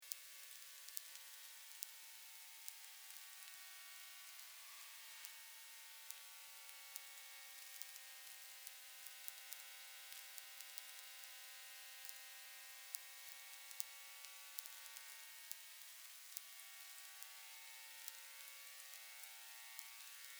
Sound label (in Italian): elettromagnetismo recinzione elettrica